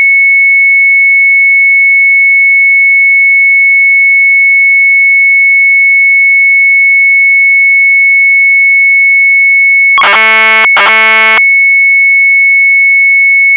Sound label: MZ800 Tape, sharp; SNAKE; retromelsarchive